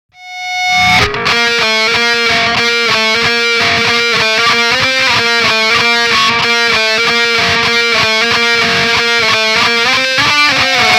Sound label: b flat major guitar